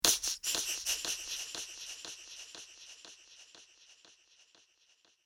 Sneaky Villian Laughter With Delay, evil laugh; Sneaky Villian Laughter 1 With Delay; Evil Laugh Sound Effects

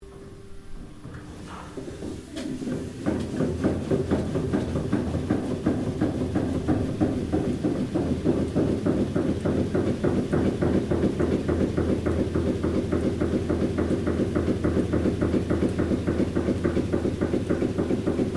Steam Generator Operating, sounds onboard; Kelly And Lewis; Boats; Sounds onboard HMAS Castlemaine

